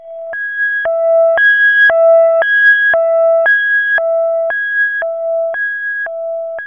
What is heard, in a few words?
Msx Siren; Zero-G; Soundclash Nu Dance Electro; Acidized Wavs; FX Atmospheres